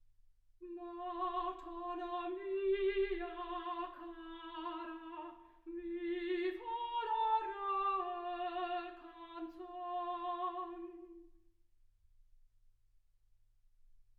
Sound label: soprano